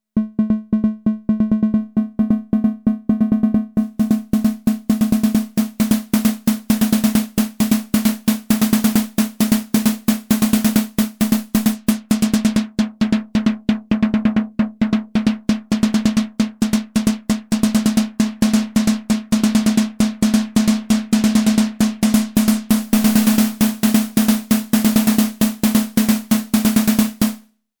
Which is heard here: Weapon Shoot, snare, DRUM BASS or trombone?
snare